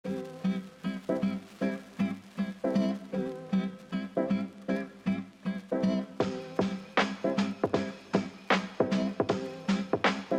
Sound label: Jingle Bells